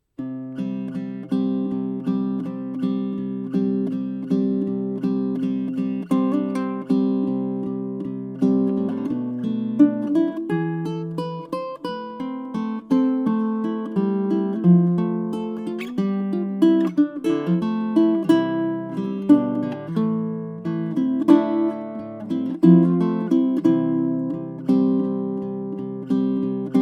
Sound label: guitar